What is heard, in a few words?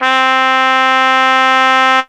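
trumpet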